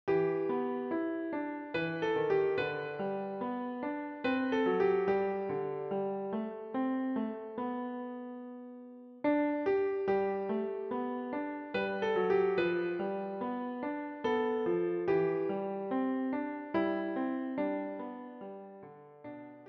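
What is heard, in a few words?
piano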